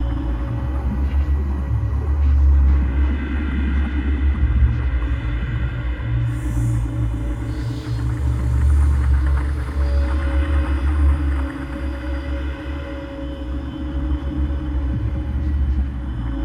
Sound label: sounds music